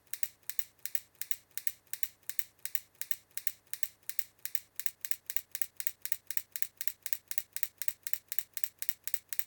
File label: Low to High Voltage